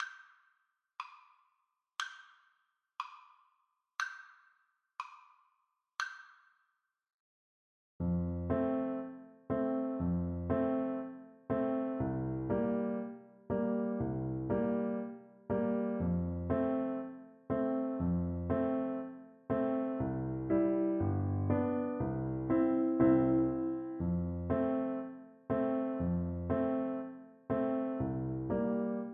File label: horn, French Horn, hornpipe